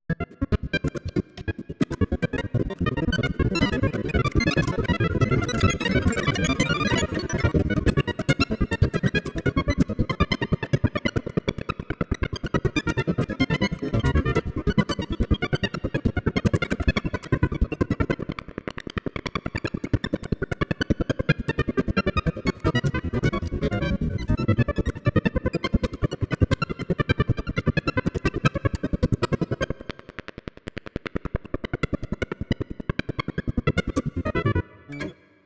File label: Granular Synth; ChatDSP